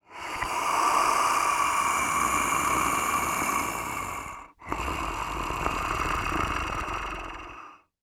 Horror Scary Alien Breathing

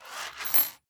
Household Keys Slide On Desk

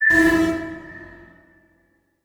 Transition Bell Hit; Adobe; Production Element

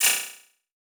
Flash Animation Short; Multimedia Internet CD-Rom Flash

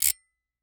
Industry Metal Clink Gear Fast

